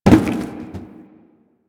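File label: Cardboard Box Impact; Warframe; Ambience; Nineteen Ninety Nine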